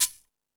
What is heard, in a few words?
FX Sounds, Drum Machines